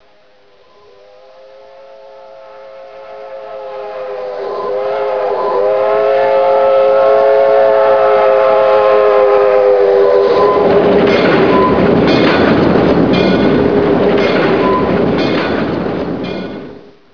steam train; svencoop; hunger; thambs

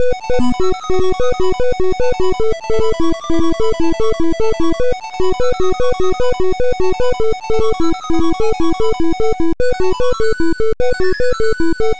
tetris music; svencoop; sc tetris

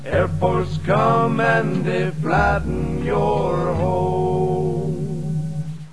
stadium

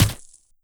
ric grass

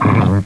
shock trooper pain

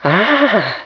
alert; svencoop; hunger; hungercrab

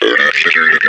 pain; kingpin

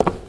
step; svencoop

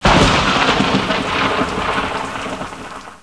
thunder; svencoop; hunger; thambs

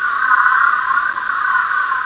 seeker amb; svencoop; kingpin

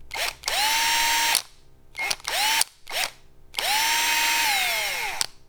repair sound; svencoop; th escape

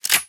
TOZ close, dbarrel; svencoop; hunger; weapons

